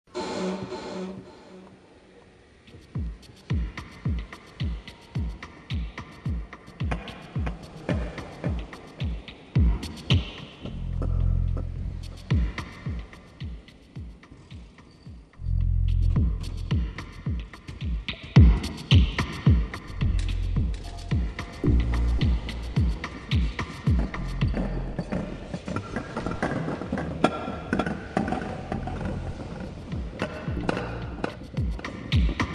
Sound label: dub